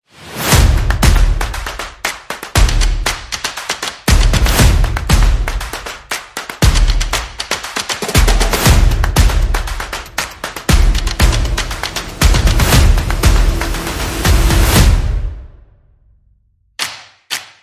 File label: Percussion and Effects